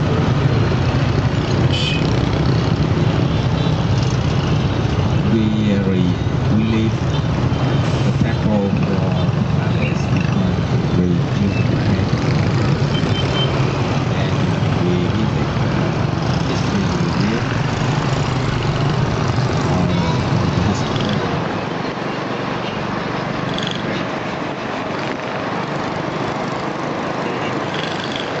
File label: traffic, Asia